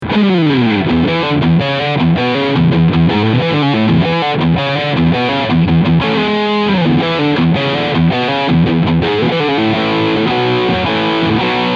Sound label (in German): Heritage Guitar H 150 OSB E Gitarre Riff Sound Steg Pickup